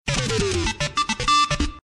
Spacey

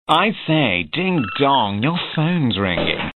Ding d**g tone

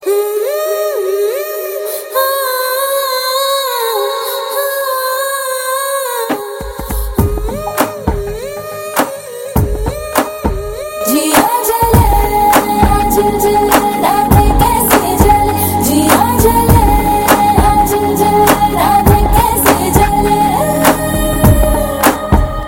Telugu

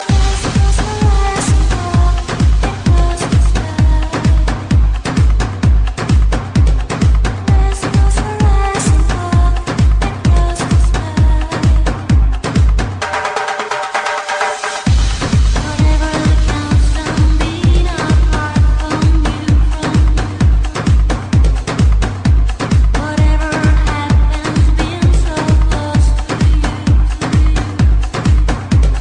Brainbug Tribal

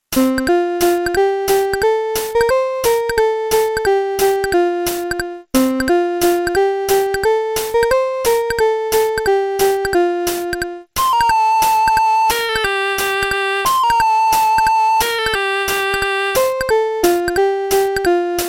Casio Vl-tone Vl-1

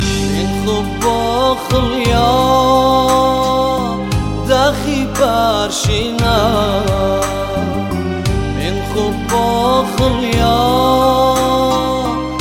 Assyrian Khoba

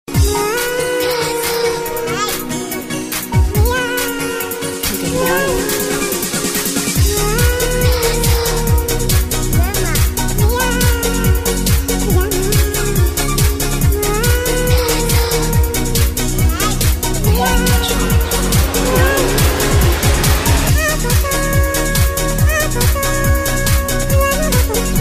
Baby Trance

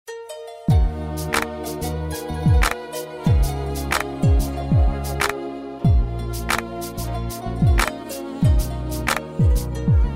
Beautiful Piano

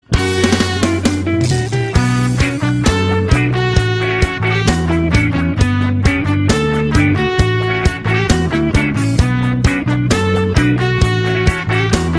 Punk Rock

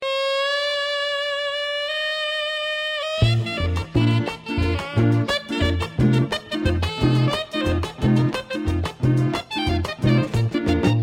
Puro Sax

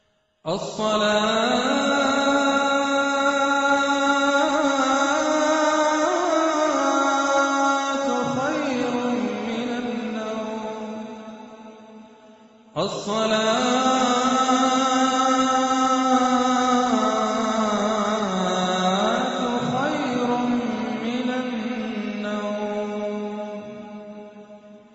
ALARM; FAJR

